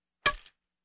udar suhoy; Звуки игры в бадминтон

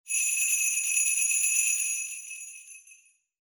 korotkij zvon

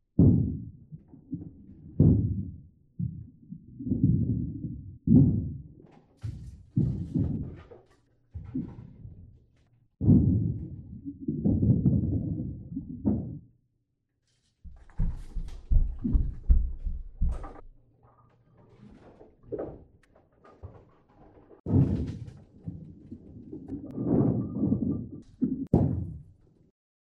sosedi sverhu katajut shary

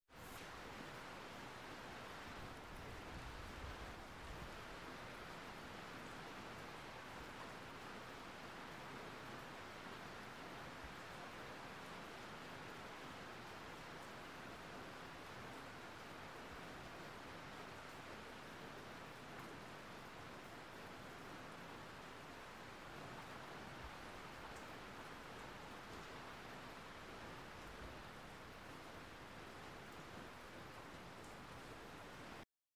zvuk srednego dozhdja v interere

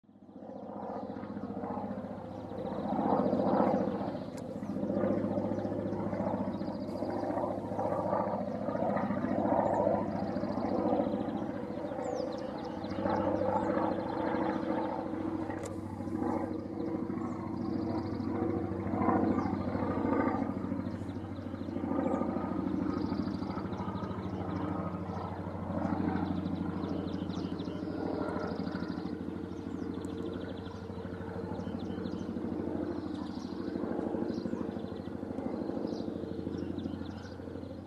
Kukuruxnik kruzhit nad polem

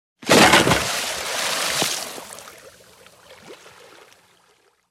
bolshoj kusok lda padaet v vodu

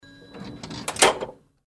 vydvigaetsja kassa i zvuk derzhatelej deneg

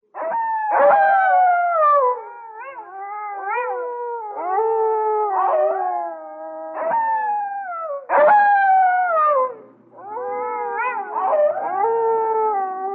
voj volchej stai

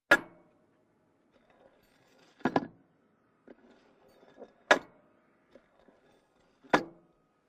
Zvuk tanka; Звук танка; Звук танка в движении, заводка, старт, остановка внутри и снаружи. Выстрелы танка

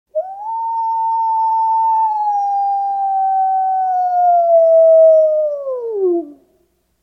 vysokij voj odinokogo volk

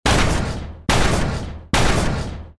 molot po met dveri

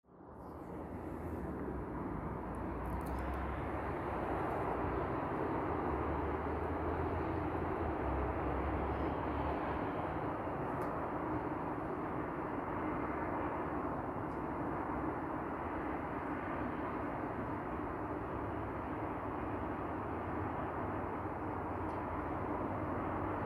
zvuk mashin v nochnom gorode

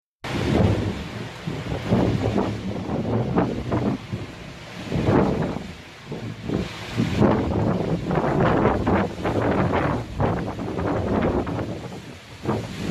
Silny veter i shum listvy derevia sgibautsa

